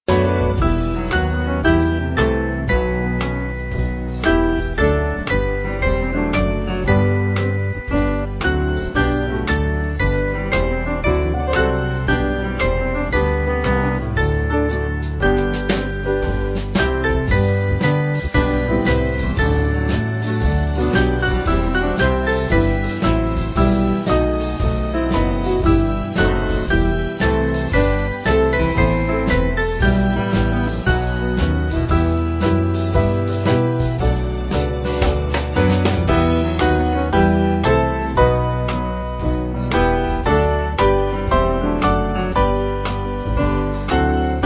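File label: Band, Free Music Backing Files for Churches; Leoni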